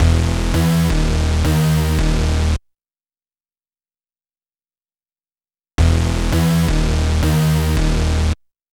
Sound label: SYN, Drum & Bass; Best Service ProSamples vol.03 - Drum & Bass 1; AKAI